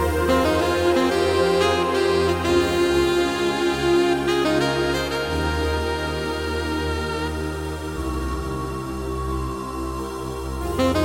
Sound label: Sax